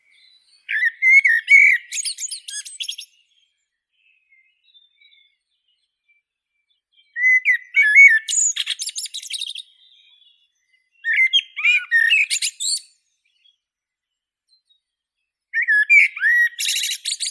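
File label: blackbird, Turdus merula; drozd chernii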